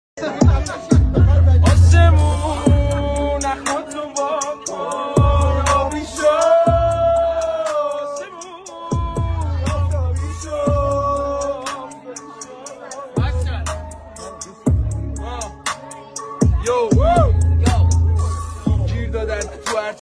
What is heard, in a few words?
music shad car